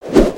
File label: hammer; aronwk collection; ndaudio